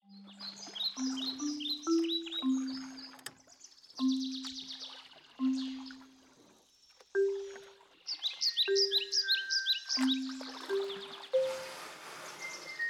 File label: From Water To Sea Relaxation